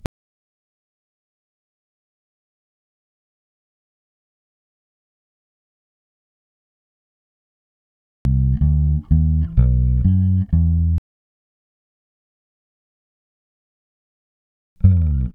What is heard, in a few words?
125 Am Bass